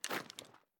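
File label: pistol reload empty fast mags large raise; gmod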